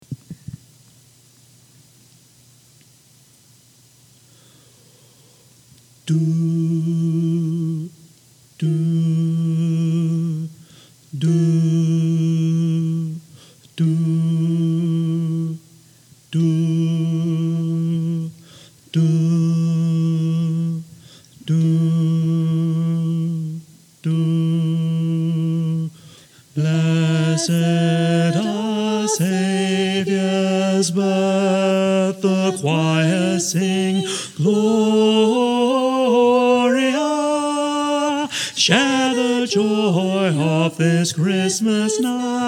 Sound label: TENOR